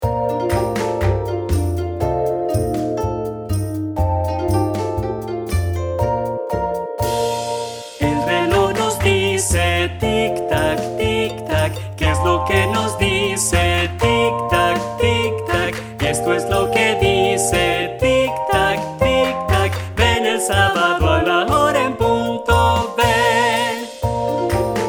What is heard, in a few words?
Tic Tac; Principiantes